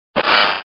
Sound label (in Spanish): Grito